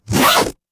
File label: paper fabric zipper